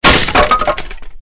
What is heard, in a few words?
skel archer die; classicro